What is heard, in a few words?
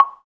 PER Percussion